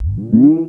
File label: tape fx, scratch; Ambient Grooves